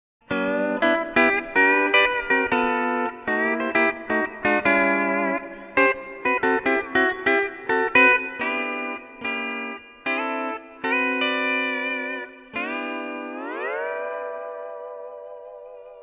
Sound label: Bass AC, AXE FX 3